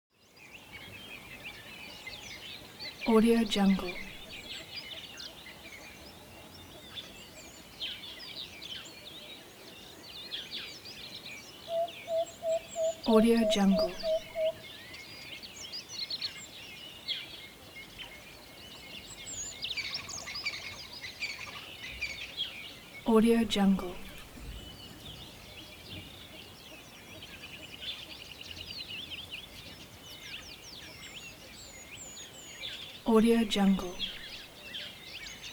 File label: bush water hole early morning; atmo; smartsoundfx